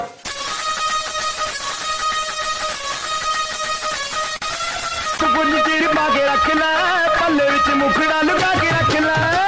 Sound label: indian meme music full loop